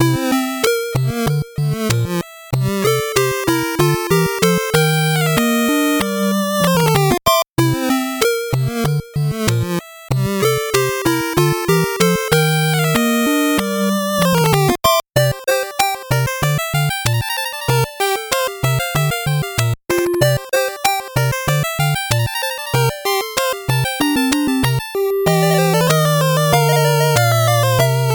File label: 8bit bgm slow